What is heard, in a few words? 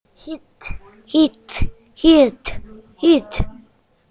hit